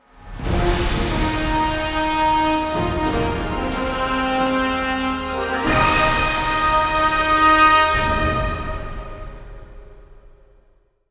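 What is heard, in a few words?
Win Jingle; rise of venice windows gamerip 2013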